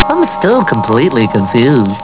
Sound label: confused